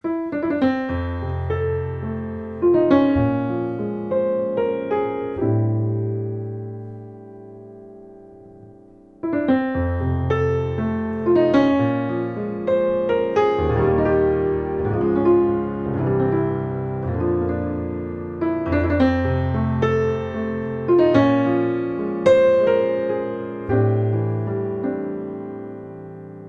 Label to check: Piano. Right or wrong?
Right